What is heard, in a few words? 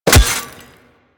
Melee Staff Impact Robotic Flesh